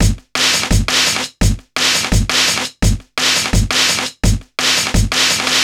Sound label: Rough Beat, Drum & Bass; Fluid Dynamics